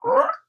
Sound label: Leg Eater Alert; gamerip